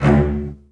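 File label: CELLOS E2, Strings; ILIO; Synclavier Strings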